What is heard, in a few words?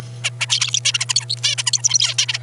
Plastic song